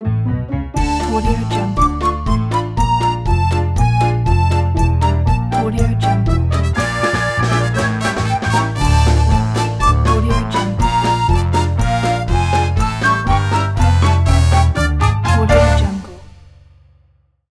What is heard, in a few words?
its joy time ident